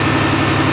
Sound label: Move90 run; finnish